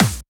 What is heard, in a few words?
Bassdrums Breakbeat; Vengeance essential club sounds-3